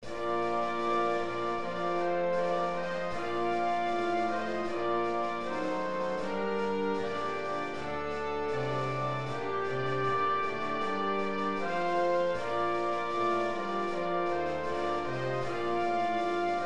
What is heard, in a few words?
ukrainian national anthem